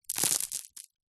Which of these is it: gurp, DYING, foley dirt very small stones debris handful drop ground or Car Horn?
foley dirt very small stones debris handful drop ground